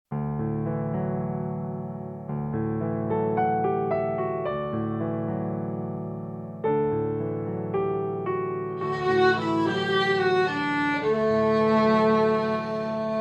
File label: Beginner Violin Piano 110 BPM